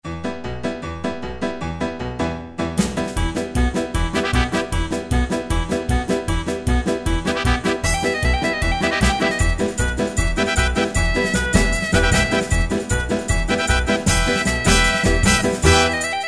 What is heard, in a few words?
Klezmer, Yamaha QY70; Preset Styles